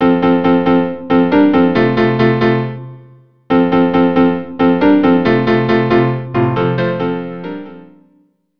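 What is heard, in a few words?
cello piano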